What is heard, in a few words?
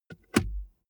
car bmw e46 interior center console close